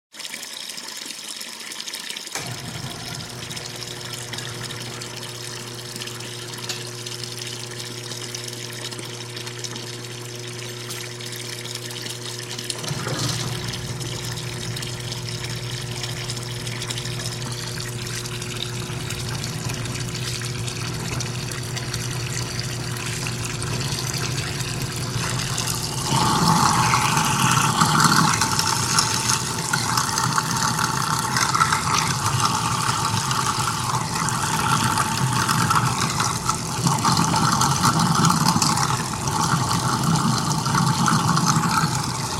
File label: Garbage disposal; SOUND EFFECTS1